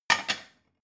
plate shelve

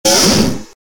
servo agressive

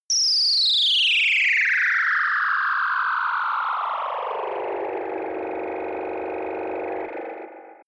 ringing drop

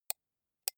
computer mouse right click slow soft

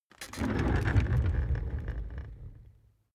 office chair loaded trundle

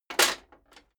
metal plate armor movement single

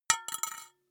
glass metal small drop

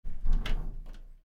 door

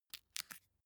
match take

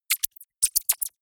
electronic bird chirp short

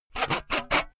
sawing hard unsteady short

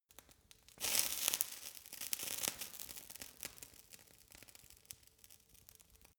fire torch sizzle crackling

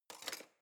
knife wood drawer place soft

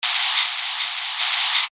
future electric arcing and swoshes

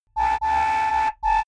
typewriter sequence

